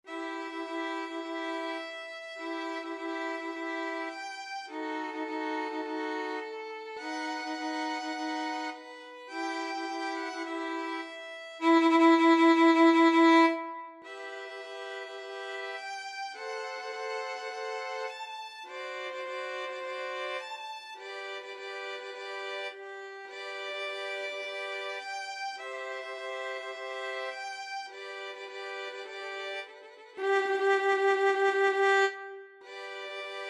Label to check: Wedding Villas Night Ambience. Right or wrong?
Wrong